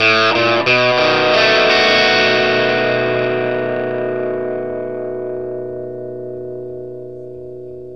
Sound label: PICK B 7, Vintage Blues Guitar; USB Soundscan vol.22; AKAI